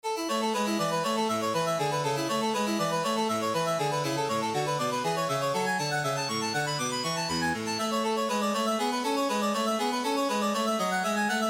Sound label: tocatta, harpsichord